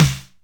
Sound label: SD BONUS, Drums; Best Service; Real Mega Drums VOL-1